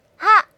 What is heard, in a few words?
Attack Ha